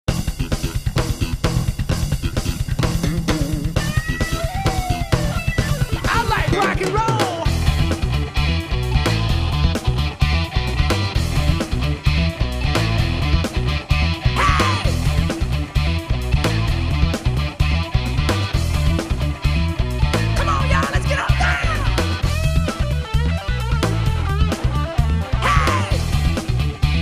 MIXTURE ROCK

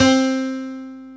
cemb

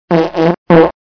trio fart!; Voice Cloak Plus; Acousticons

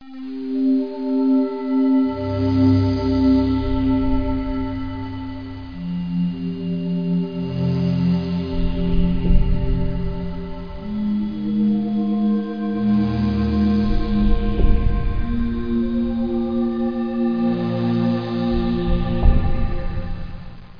mystery

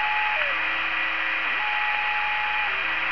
STATIC

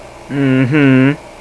mmm-hmm; People; Sound Library 2000; Wayzata Technology

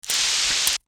Pin Sweeper Back; bowl blast

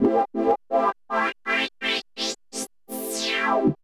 SYNTHPAD HOUSE 125 A; eJay; Sphere

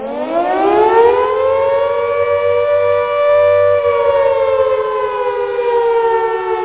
air raid siren alert warning

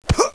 Ouch; Close Combat

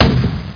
CANNON; K TANK; KillerTank

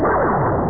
zap; stryker

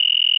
cricket; font

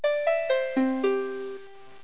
connect; 11,025 sample rate; 2 seconds; TerrapinFTP

